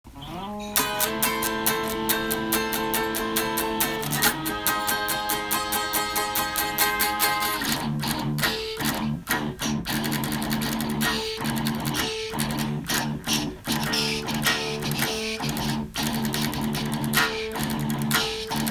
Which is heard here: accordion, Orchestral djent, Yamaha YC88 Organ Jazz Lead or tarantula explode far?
Orchestral djent